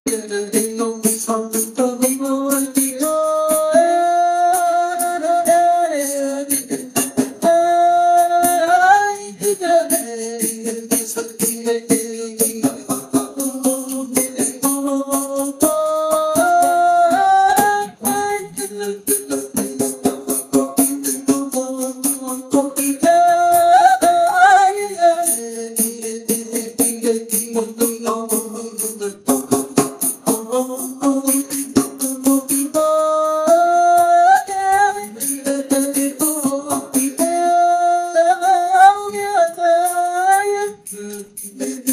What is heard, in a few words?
kenya music singing; africa